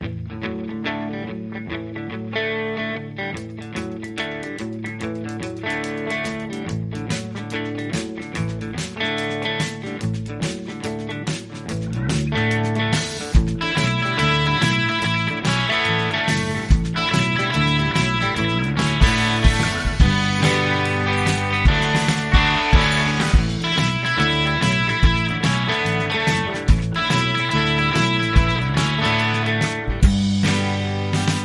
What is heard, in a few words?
Clapping Boots